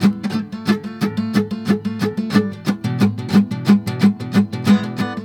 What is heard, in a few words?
GUITAR, Balkanish Traditions